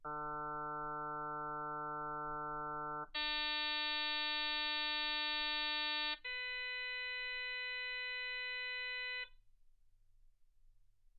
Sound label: harm sinus 48kHz